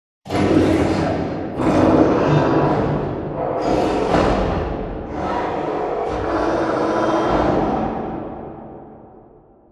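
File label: Assembly Wall Arm Distant; Boomtown; Titanfall 2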